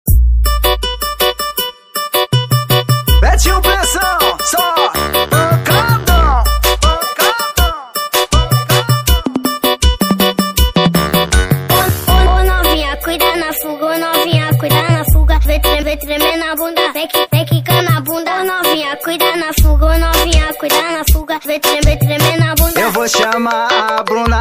remix batidao

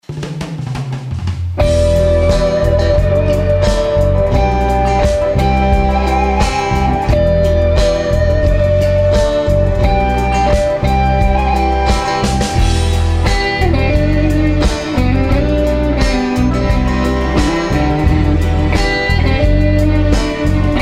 música

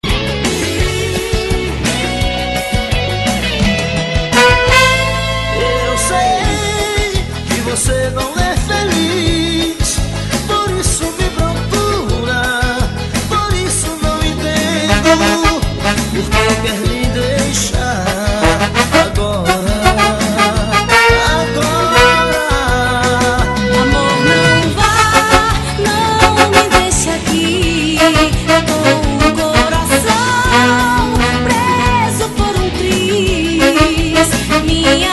metal

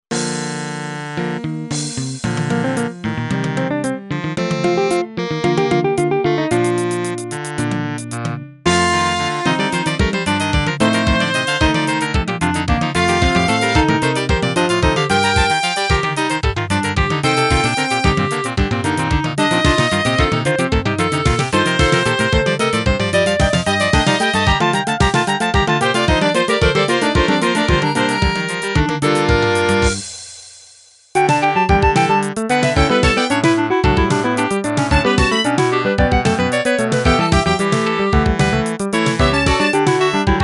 solos polifonicos; festival de inverno

